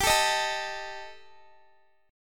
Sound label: G Suspended 2nd Sharp 5th, Guitar; Gsus2#5; strummed; x,22,19,20,21,x